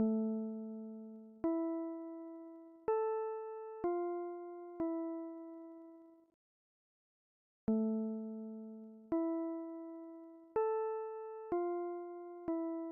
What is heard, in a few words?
aeolian shadow, motifs; vault; voxel audio preview